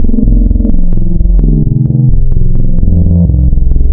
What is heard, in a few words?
Spectral, wavetables; Architecture 32